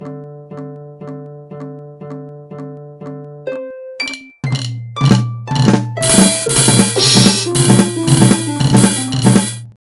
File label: Sonification